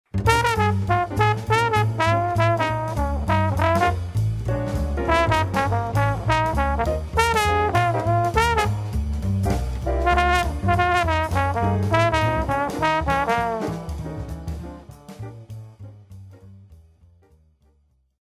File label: Phrasing Exercise